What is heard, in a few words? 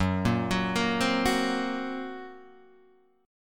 F sharp Major Flat 5th F sharp, Guitar Half Step Down